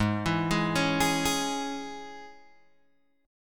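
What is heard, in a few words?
Ab Suspended 4th Sharp 5th chord, Guitar, Open G tuning; down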